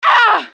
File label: Pain female